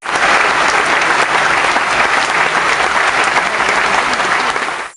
spec Clap

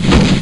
dodge

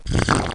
slurp

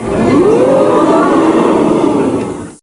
spec Ooh

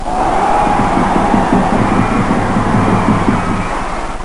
touchdown

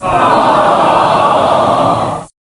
spec Aah

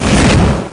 fireball

